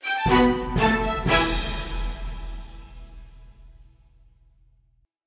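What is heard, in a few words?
sounds with effect of completion, ending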